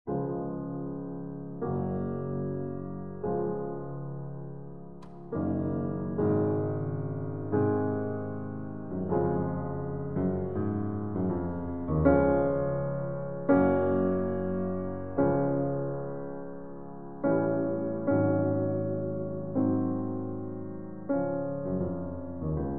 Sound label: Piano Sonata in F Minor, Andante con moto; Piano Sonata No. 23; Op. 57 Appassionata